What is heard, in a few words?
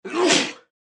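Male Single Sneeze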